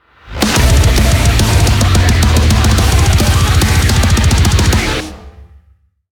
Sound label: transition; Doom